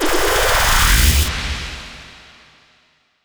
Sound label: VFX Short Fills & Reverse